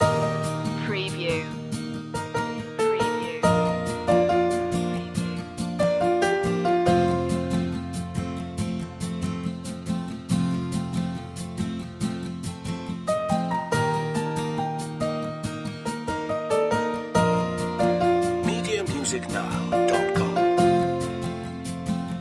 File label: acoustic